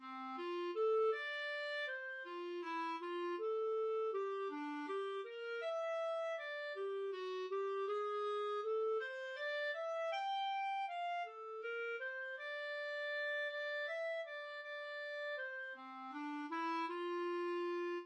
lille ole, clarinet

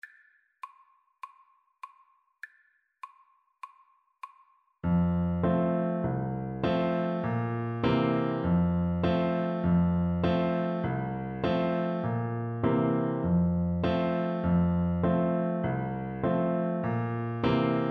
Tuba

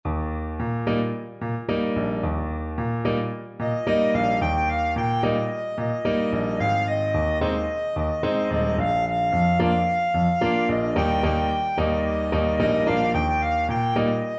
singaba, clarinet

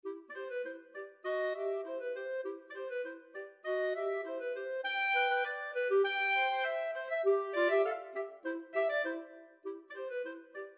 clarinet duet

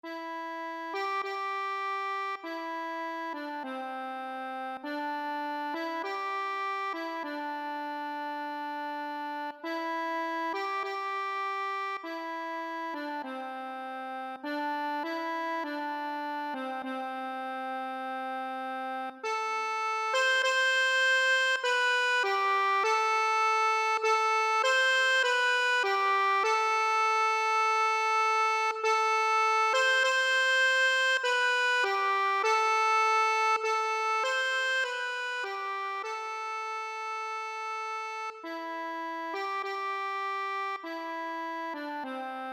accordion

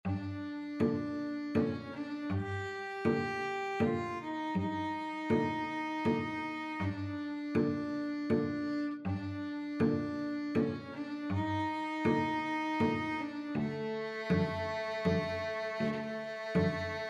violin cello duet

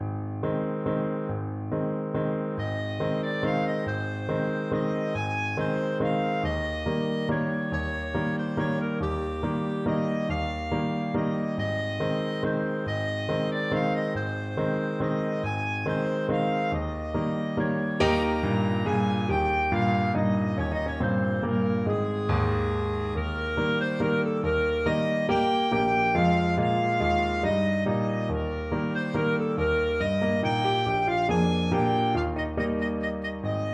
clarinet; tic e tic e toc

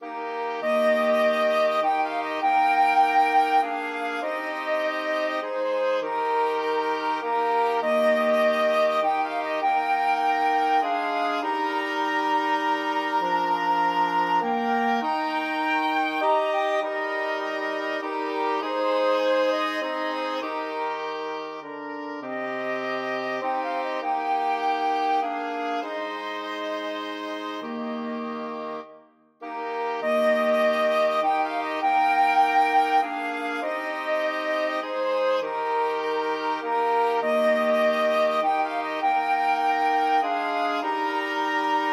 flexible mixed ensemble 4 players

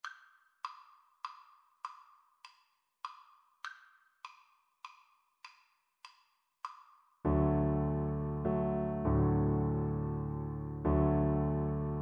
silent night violin

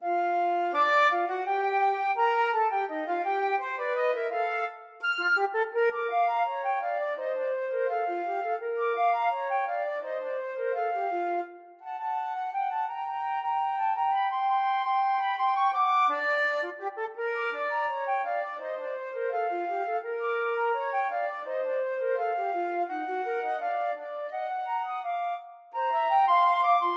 euphonic sounds FL duet, flute duet

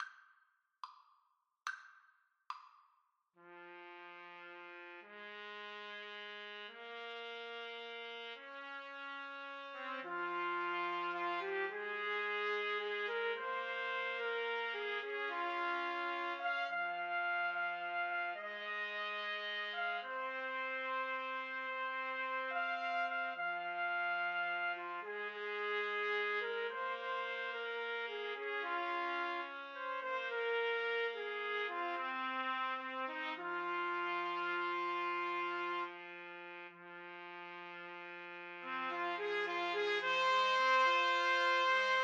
house of the rising sun trumpet trio

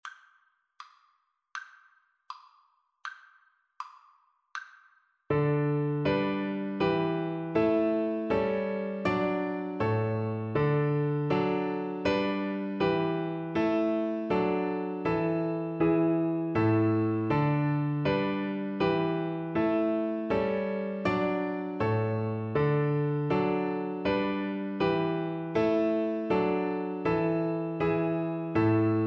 gavotte cello suite violin; bach

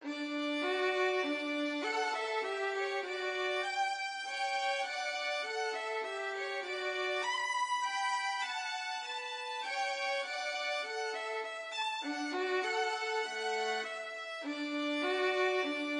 Mozart violin duet

